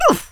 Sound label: oof